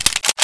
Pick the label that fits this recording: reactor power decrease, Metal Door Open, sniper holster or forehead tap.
sniper holster